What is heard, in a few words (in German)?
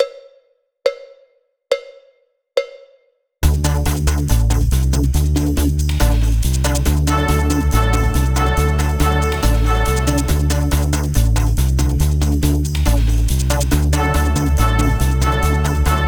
Akzente 3er Verschiebung Tmp 70; warm-ups